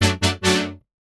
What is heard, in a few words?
Synth Shot F# Future; Trap and Dubstep; Synth One Shots; Ghosthack; Free Trap and Dubstep Samples 2018